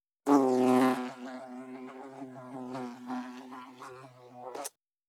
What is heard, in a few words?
Insect, Fly - take off, flying away, landing; Audionym; The Fly